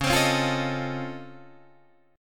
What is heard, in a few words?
C sharp Augmented Major 9th, C sharp, down, Guitar, Open D